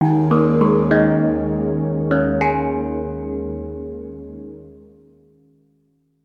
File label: sound logo